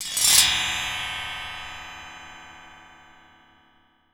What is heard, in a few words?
metallic, water fx